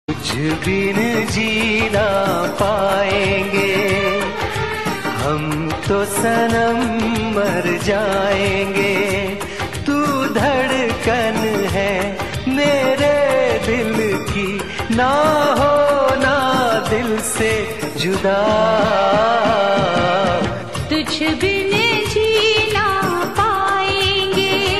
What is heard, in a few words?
hindi song